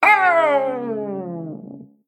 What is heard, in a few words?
Female Mech, Death